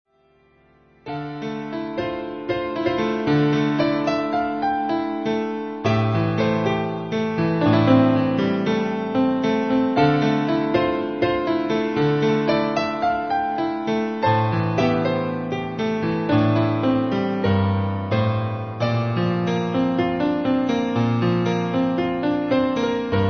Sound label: piano